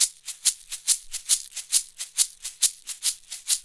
Roots Reggae; Sounds Good - Roots Reggae

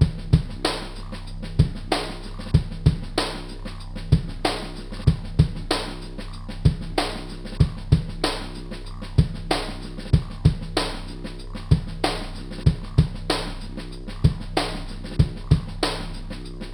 Digi Time, Yamaha DTXpress, Drum Loop

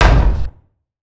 Orchestral hit attempt

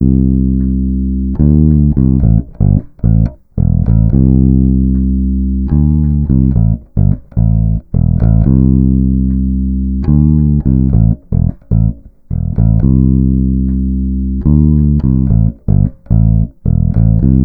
Bass, Electro Lounge; Sonic Foundry (Sony Creative Software); 110 Kickin

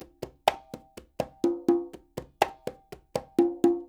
NYC Percussionworks loops; Sampleheads

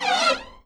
SNOZE, Disjointed Textures; USB Soundscan vol.33; AKAI; CHAOS

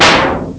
blast; ST-XX Amiga Soundtracker Sample Packs

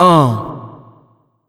VOX, ADLIB MEN, Funky Vocals; USB Soundscan vol.18; AKAI

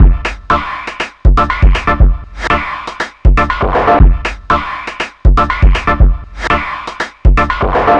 elect off 120bpm

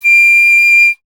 FLT PIC D, Piccolo; Miroslav Vitous - Solo Instruments VOL-2

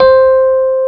C 2, Electric Pianos; Wizoo

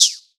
FILTER, PERCUSSION; Drum Hits

